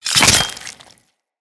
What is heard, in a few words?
Dagger Slice Flesh; Weapons; Dual Daggers; Hit Effects